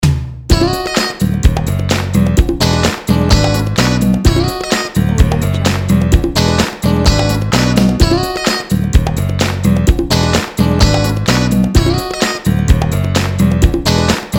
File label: frisky fun retro pop